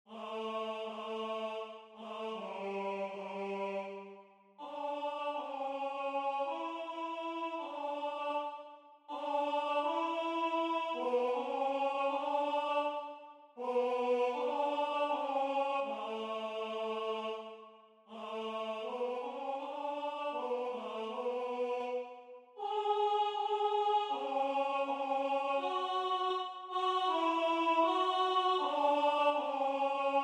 Alto

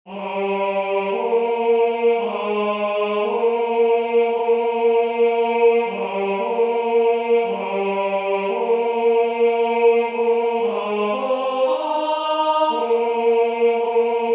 Tenor; SaintSaens; Ave verum

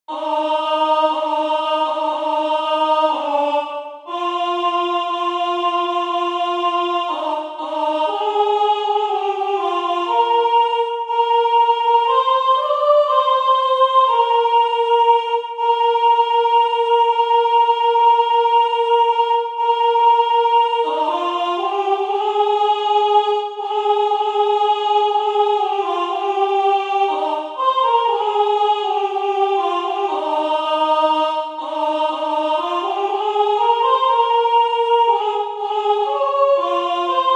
Soprano; Gounod; Priere du soir

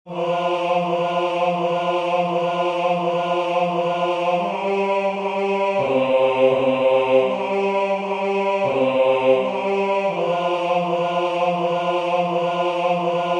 Bass

